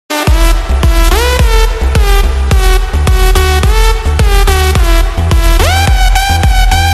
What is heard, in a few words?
slowed with reverb